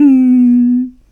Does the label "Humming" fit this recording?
Yes